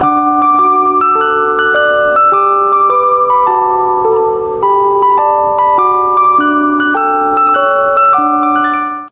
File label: ringtone; 鉄道列車